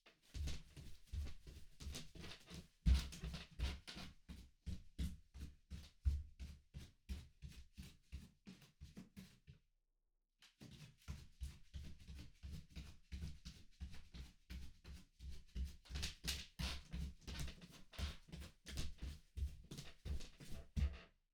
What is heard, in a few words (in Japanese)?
行ったり来たり 高速, 足音, 裸足; 3DIO